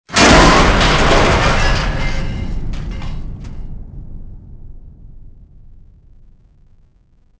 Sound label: metal; Soldier Of Fortune II Double Helix; XBOX